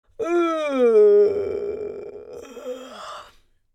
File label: Female old woman yawn; yawn sound effects